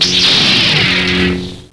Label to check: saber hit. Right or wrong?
Right